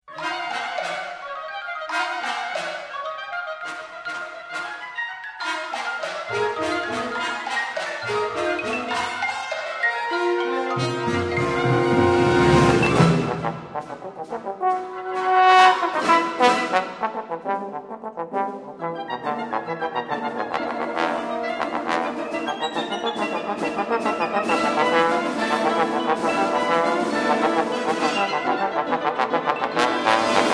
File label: Bass Trombone and Wind Ensembe